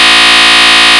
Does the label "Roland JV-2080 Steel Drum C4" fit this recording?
No